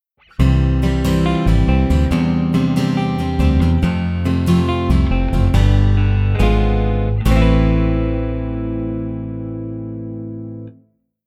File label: Keyboard